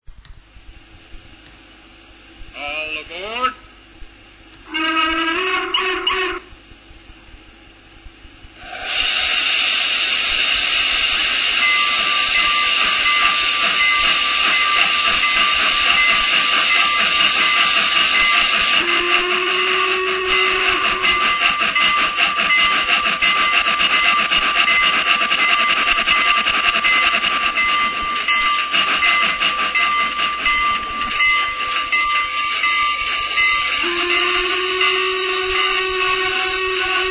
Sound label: MALLET